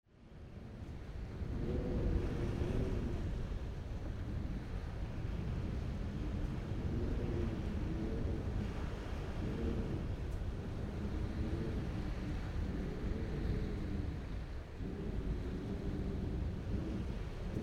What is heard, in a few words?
Cabin Sounds Wind; YELL